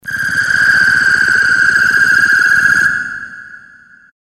ambient creatures swell

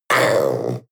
panther young roar short

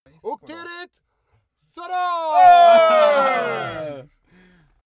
bawl short

